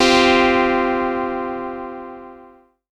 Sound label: SYNTH